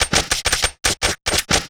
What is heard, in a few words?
Musket Dry Fire Multiple; Period Combat; Sony Pictures Sound Effects Series